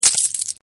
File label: item buy